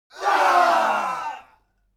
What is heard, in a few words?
human crowd death cry; smartsoundfx